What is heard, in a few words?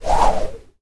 rope swinging swish